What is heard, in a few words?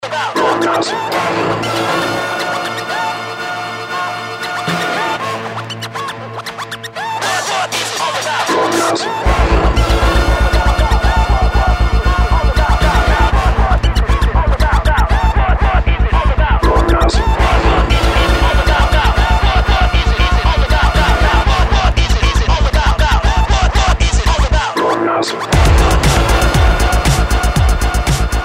Electropunk